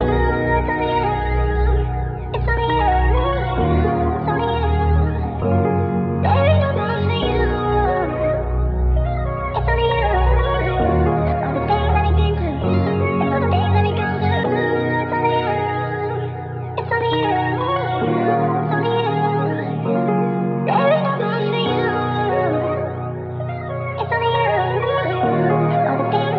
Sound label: Only You 133 BPM D# Min, Melodies; Cymatics - Immortal Production Suite Beta